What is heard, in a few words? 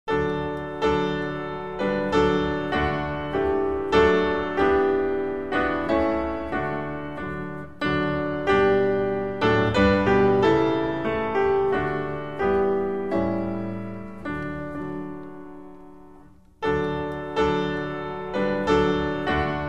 instrumental; hymns spanish